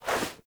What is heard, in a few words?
Speargun Throw Release Foley; Warframe; Weapons